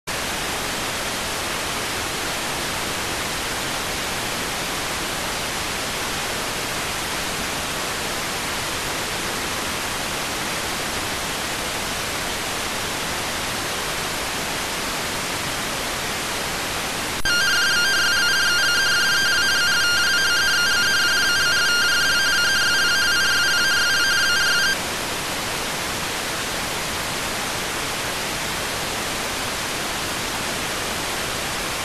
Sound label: rtty rx